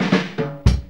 fill 116; Zero-G; Funky Elements

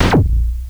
Quirky Snare, MultiMoog

